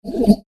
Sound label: Air Laser Wiggle; Laser Sound Sound Effects; science fiction sound effect; Andrew Fleming